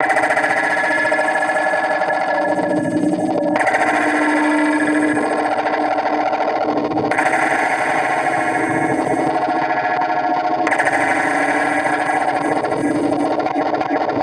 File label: GUNSHOTS; ALIEN HOOD